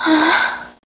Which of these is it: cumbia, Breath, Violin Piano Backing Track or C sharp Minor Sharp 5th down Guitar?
Breath